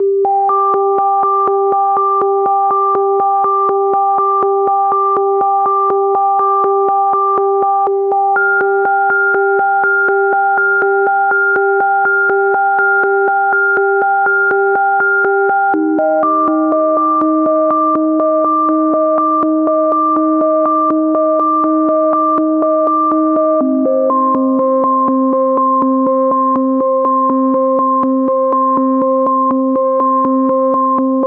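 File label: 122 BPM Melodic Arps C major A minor Tonic F; MMB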